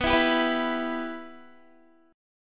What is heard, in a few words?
B Suspended 4th Sharp 5th, Guitar; strummed; 4F,4A,5C,5G,5C,6E; Bsus4#5; JGuitar